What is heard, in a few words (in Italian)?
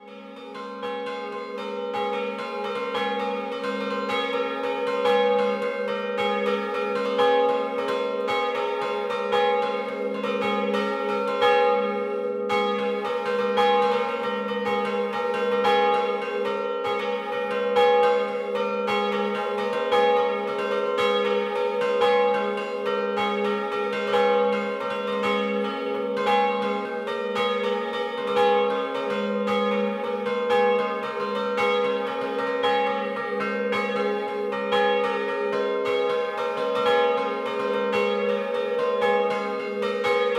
Zomeais Scampanottata, campane